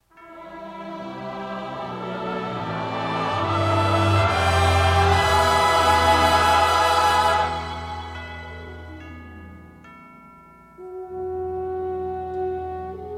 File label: Prayer Response, Band&Vocal Track